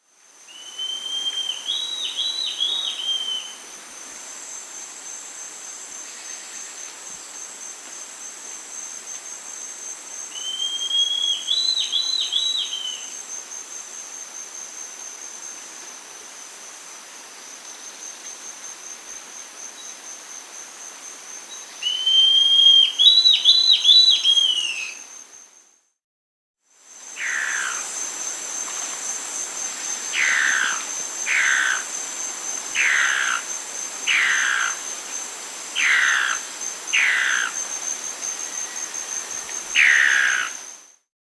Hairy-crested Antbird (Rhegmatorhina melanosticta), Peru; S. America